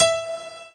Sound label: piano